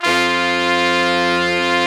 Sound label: BRS, R&R Horns; Roland - Brass, Strings, Hits and Combos